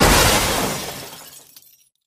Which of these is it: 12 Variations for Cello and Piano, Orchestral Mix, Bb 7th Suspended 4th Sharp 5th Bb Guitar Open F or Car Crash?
Car Crash